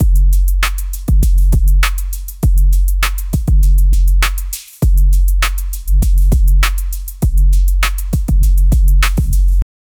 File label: chill rb loop with sub, r b